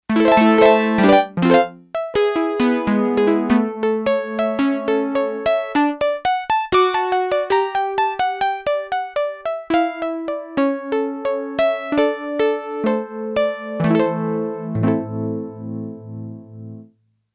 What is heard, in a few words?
Classipika, Yamaha TX802; Factory Patches; Voices